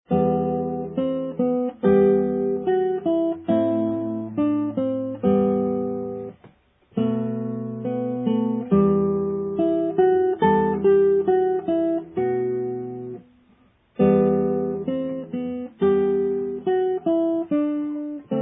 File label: romanze, guitar